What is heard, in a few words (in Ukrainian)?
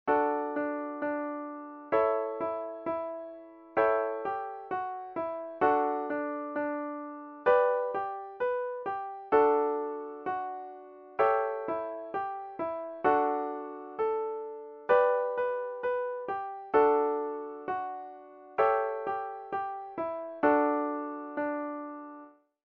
baraban